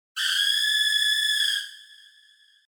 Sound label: scream; 叫び声(恐怖)2